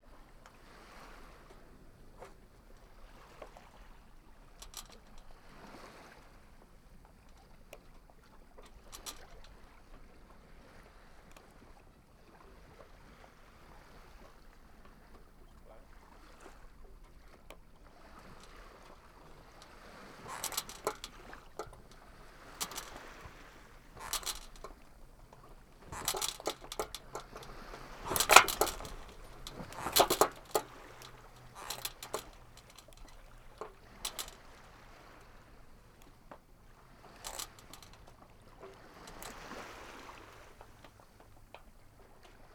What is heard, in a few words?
Sailing